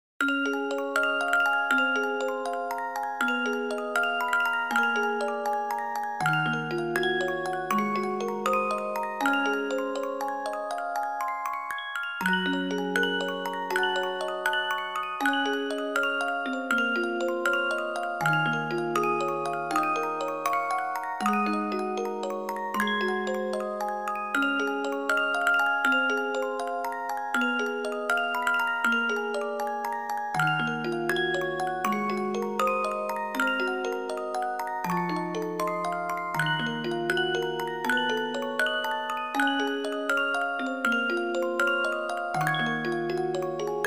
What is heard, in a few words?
jingle bells, xmas